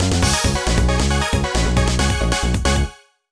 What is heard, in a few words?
Jingle Draw